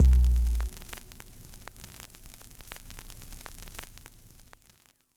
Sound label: 84 bpm, Breaks; POTBreaks